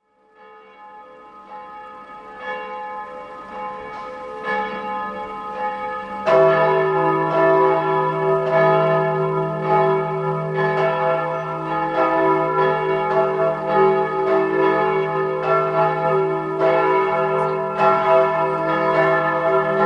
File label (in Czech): souzvuk zvonu; chrudim; arcidekansky kos.